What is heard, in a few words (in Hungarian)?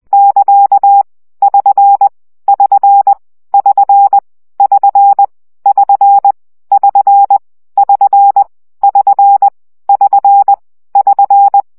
CW gyakorlas sn 17 baud